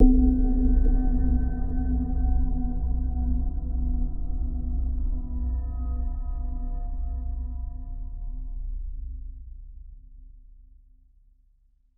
moody hit; sven; th escape

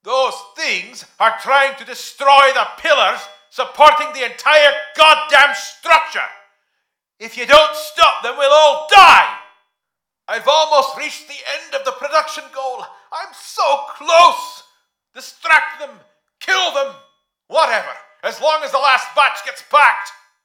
boss arrives

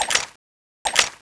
draw; svencoop; custom weapons; cso; skull2

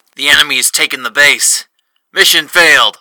fail

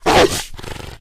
pdog threaten, pseudodog; russian; sven; russian sounds

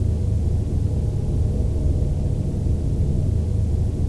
dualsword idle; sven; custom weapons; cso

